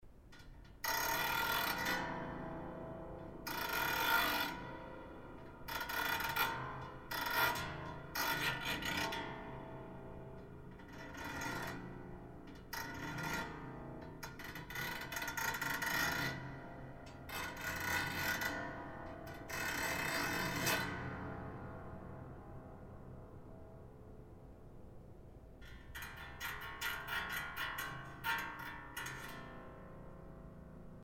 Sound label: Piano